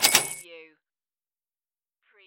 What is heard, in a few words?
money